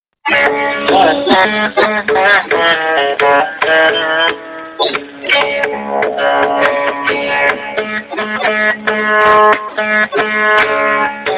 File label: Veena